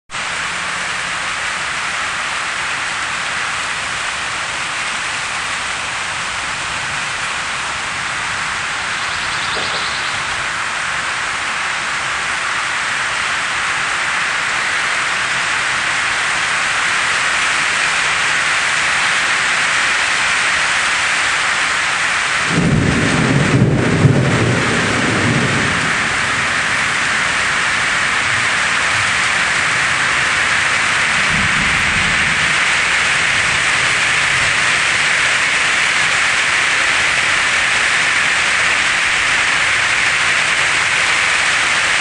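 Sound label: Thunderstorm heavy, nature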